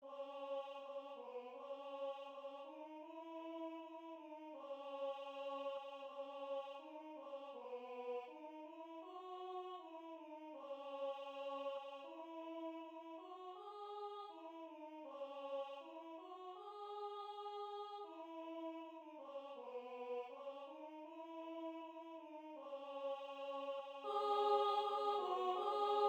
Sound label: veni pater voice 1